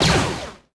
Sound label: Droid Blaster; weapons